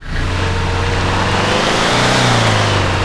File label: first, vehicles; gmod; tdmcars; gtav; camper